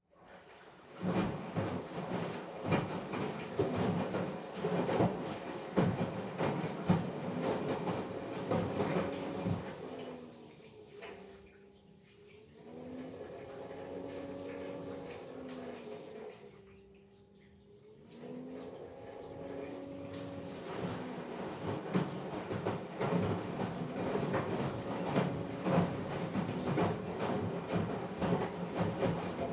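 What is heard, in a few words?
Washer Front Load Operation Noise Far Wash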